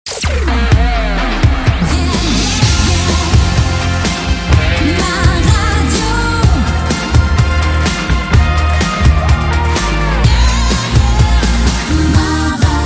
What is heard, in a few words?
ROCK